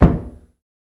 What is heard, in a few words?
Tub Hammer Hit Dampe; hammers